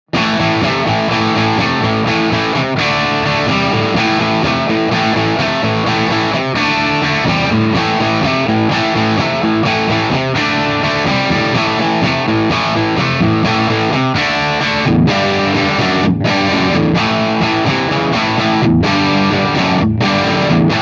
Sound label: Terror Stamp Classic Rock